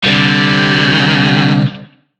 Shawzin Pent Maj Chord D; Warframe; Lizzie Shawzin; Chords

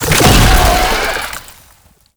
Infested Roll Start; Oni

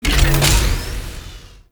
Gas City Rod Electric Discharge Clunk; Warframe; Corpus Gas City; Gameplay Remaster; Ambience

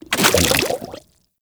Settlement Oil Bubble Burst; Warframe; Grineer Settlement

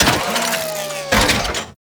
Catalogue Close; Ambience; Props; Corpus Ship

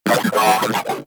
Vocal Enemy Spotted; Moa; Vocal Set D

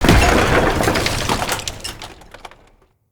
Knights Path Floor Break B; Champion Crypt

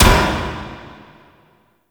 Fist Impact Metal; Enemies; Warframe; Tyl Regor; Fists

